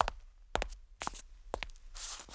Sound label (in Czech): kroky; Mafie 1